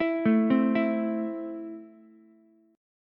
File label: E Suspended 4th Sharp 5th E down Ukulele; Esus4#5 arpeggiated